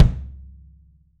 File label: kick, 3D Drums; RUBB KICK